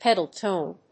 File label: pedal tone